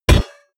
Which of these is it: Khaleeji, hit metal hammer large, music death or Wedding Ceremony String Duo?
hit metal hammer large